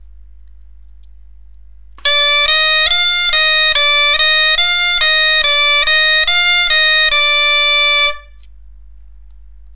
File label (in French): gamme rй mi fa, bombarde; Ré Mi Fa Mi Ré